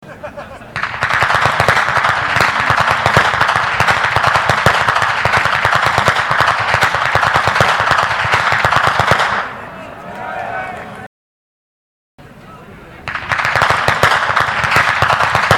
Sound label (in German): Schnalzen; Thundorf-Strass; Ainringer Schnalzen